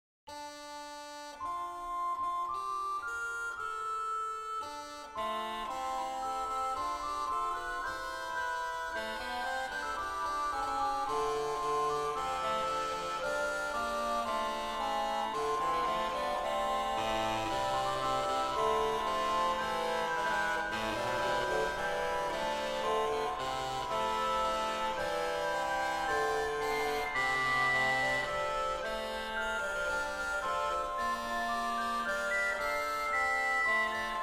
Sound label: Ricercare, organ; Froberger; callido